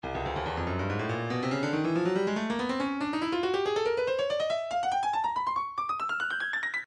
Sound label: breakbeat 141 bpm; Stylus